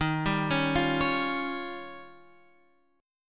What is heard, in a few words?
D sharp 9th D sharp, Guitar Open F; D#9 arpeggiated; JGuitar